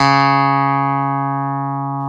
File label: PIA CLAVI, PIA Clavinet; Sound & Vision; Gigapack I CD 2